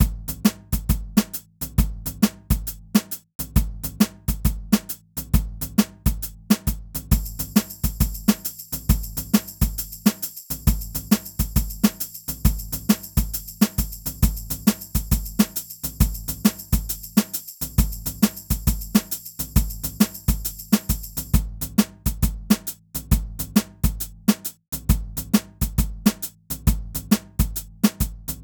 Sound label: jazzy beats and percussion 135 loop tight